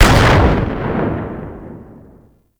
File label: Space Pod Rocket Explo; Grineer; Vehicles; Warframe